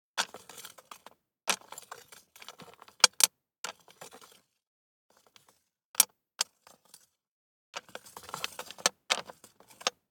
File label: DICE on Glass, Slide, Standard, 4 Four Dice; Samples; ArticulatedSounds; DICE Sfx Party (Extended)